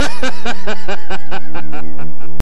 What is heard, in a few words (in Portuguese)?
Risada